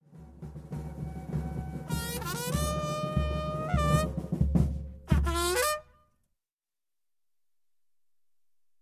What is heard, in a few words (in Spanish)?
Toque de Alto